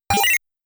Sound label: Rock - Dropping; Dig Dug